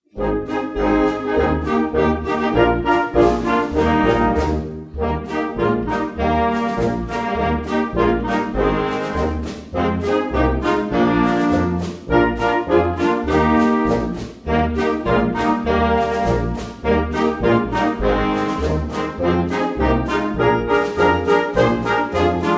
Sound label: Jingle Bells, concert band